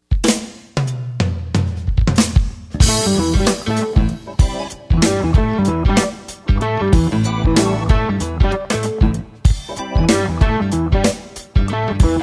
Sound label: Sample Funk Jazz; Fuchs